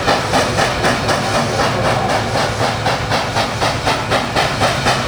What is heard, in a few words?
train running; Soldier Of Fortune II Double Helix; XBOX; ambience